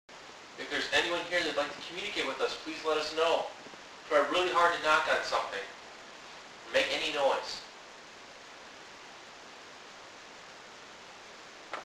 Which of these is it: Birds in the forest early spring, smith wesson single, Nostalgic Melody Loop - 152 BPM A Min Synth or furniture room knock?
furniture room knock